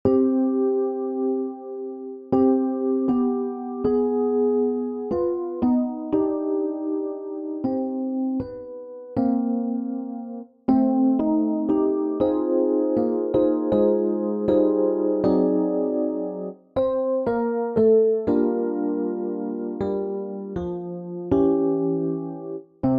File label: ssatb, midi